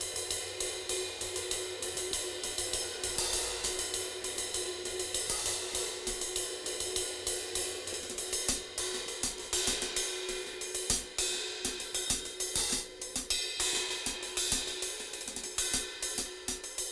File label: KL Beat 98 BPM Cym; AMG - Keith LeBlanc Phat Butt Jams